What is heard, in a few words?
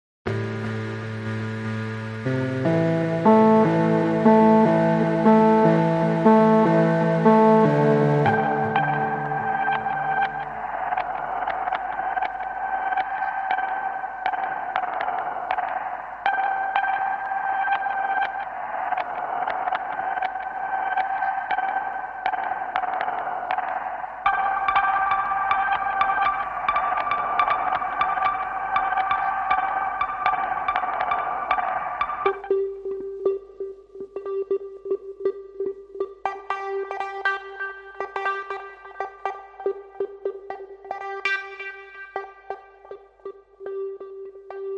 Plinky